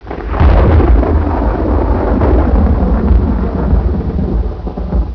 thunder

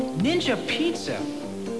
death; baseq2; turtle

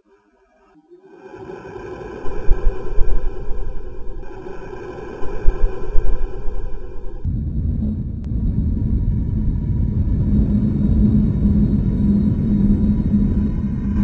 amb

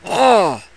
pain

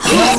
jump; devastator

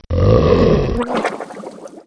DROWN; openffa; fwwolf

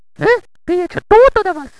death; openffa; fulimo

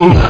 fall; openffa

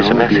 pain; hal

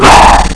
pain; cobra

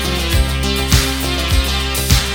safety; baseq2

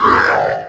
boss tank pain; lmctf

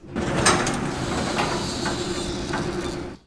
elevator

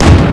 berserk fire

